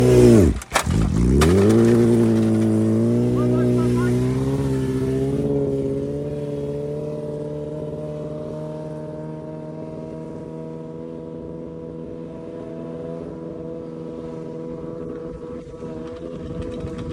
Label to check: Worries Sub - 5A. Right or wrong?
Wrong